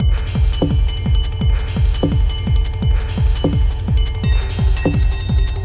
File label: delay, guitar; Obsidian; Beatiful Delay